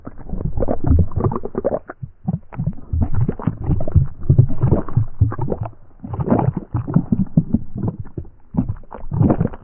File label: indigestion loop; voice; idle; Left 4 Dead 2; boomer